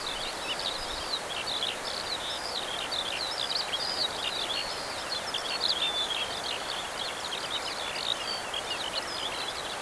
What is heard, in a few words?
larks; openffa; bozo